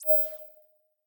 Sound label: notify; Scrat